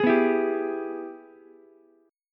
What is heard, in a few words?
G sharp Minor Major 7th Sharp 5th G sharp down Ukulele; strummed; JGuitar